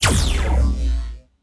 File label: big win; BARs 7s; wazdan; ultrafast